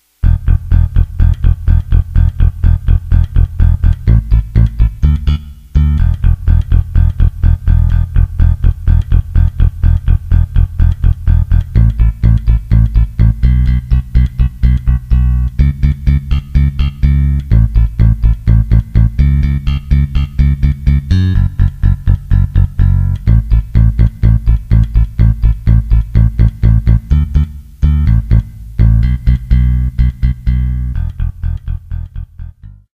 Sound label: rock simple bass